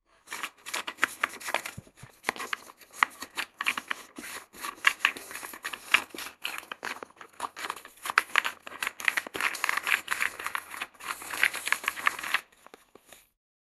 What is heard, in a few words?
industry